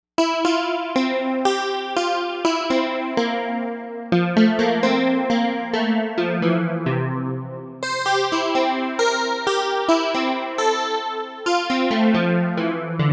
Pulse Pick Lead, Novation UltraNova; Factory Patches

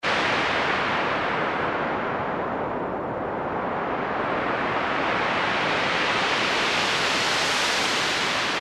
Surf's Up, Roland Juno-106; Factory Preset

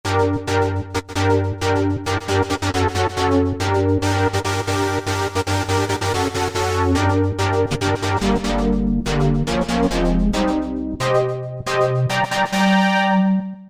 Noisy Hit, Korg microKORG; Factory Voices

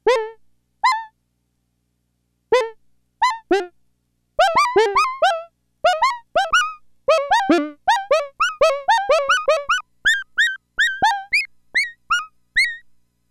Box O Pups

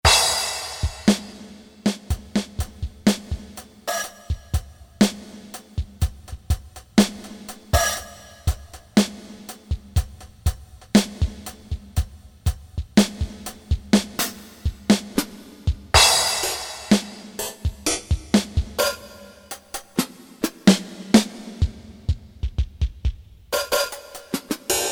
DRUMS, Korg DSS-1

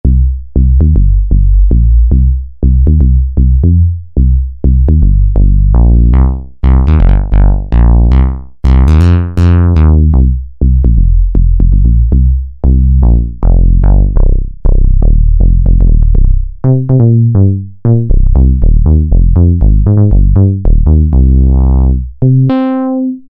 House Bass, Korg microKORG; Factory Voices

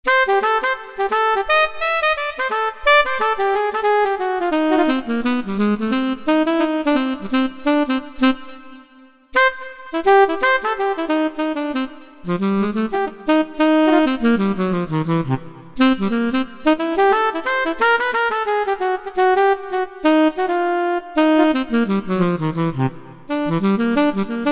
Alto Cool, Korg MSC-11S Brass

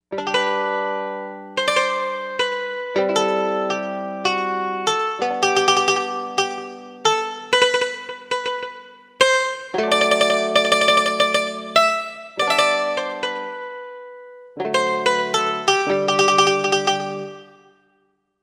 Mandolin, Korg M1Rex; Factory Patches

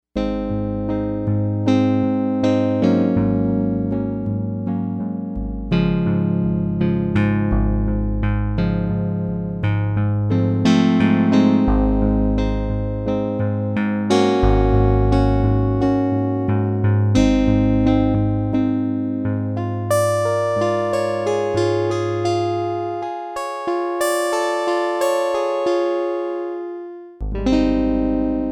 Chorus

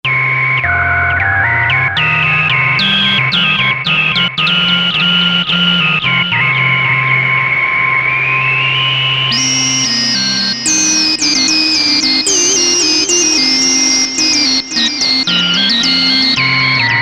Whistle, synth

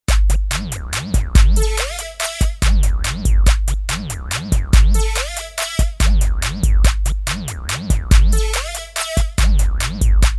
Spaced

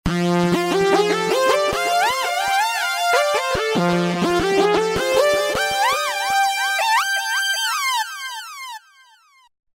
Wild on GR, Roland V-Synth

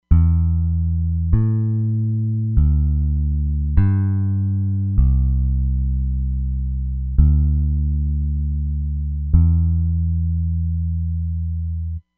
Comp’d J Bass

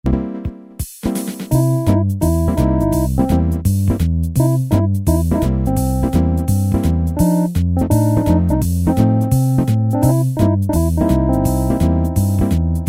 swing, Technics SX-K450 Automatic Rhythms

